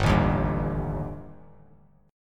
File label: G Suspended 2nd Sharp 5th G down Bass Standard; Gsus2#5 strummed; JGuitar